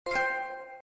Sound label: trophy sound; ps3